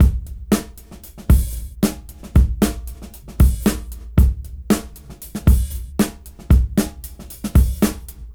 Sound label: Fat Bounce BPM 113; AMG - Keith LeBlanc Phat Butt Jams